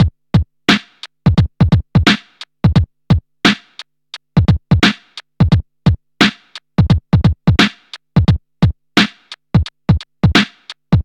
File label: 87 bpm breakbeat sample f sharp key